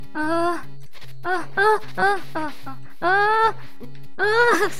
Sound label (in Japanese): ダメージボイス; 悪魔城ドラキュラ(FC版)